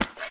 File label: defeat horn pickup; smite; heimdallr